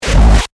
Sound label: Glitch Short Circuit, Glitch, Short, Circuit, Beep; Glitch Sound Effects